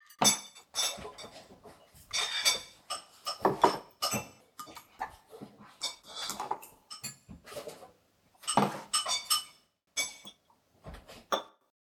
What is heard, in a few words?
home meal